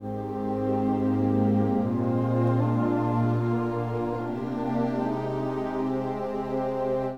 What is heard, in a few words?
strings